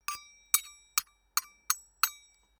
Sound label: tool box